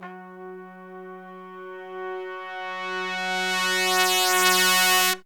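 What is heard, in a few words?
brass crescendo; Zero-G; Phantom Horns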